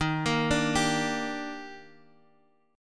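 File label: E flat Major 7th; arpeggiated; chord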